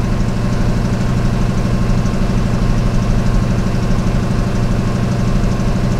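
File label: hellcat cockpit right, engines; War Thunder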